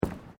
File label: Footsteps Boots Boardwalk Wooden Step; Footstep Foley; Footsteps (Sound Effects:Human)